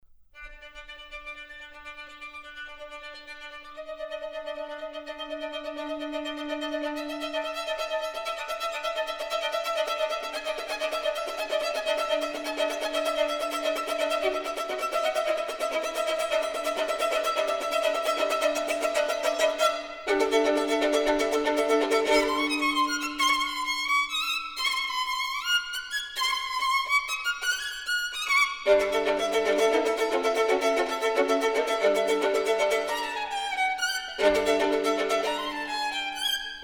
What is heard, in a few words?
Partita For Violin Solo, Toccata